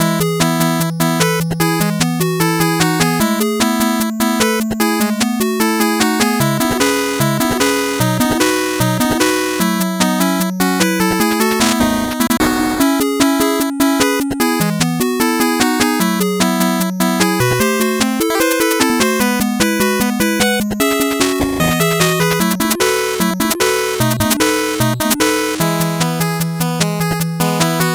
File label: 8bit bgm slow